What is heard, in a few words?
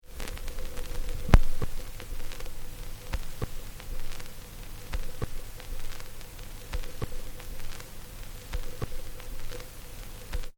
Turntable Stylus Lopping At End Of Record; Turntable Needle / Stylus Looping At End Of Record Disc - Crackle And Hiss Of Old Vinyl; DJ; Musical; McKinney Sound